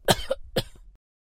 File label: Cough